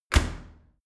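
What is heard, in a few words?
dry cleaner close hard, maschinen türen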